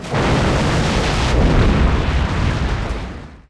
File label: Aircrafts Carrier Landing; DCS